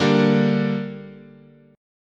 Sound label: D Suspended 4th Sharp 5th, chord